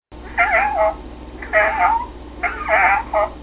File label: rabbit whimper